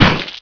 Crunch; Playable Tutorial Level; TR2

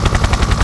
Helicopter Loop; Playable Tutorial Level; TR3

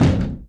Small Door Subway Close; Playable Tutorial Level; TR3

